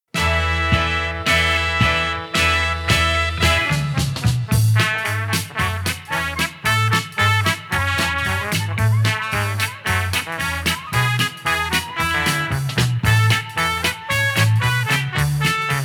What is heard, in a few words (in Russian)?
instrumentalnyiy ansambl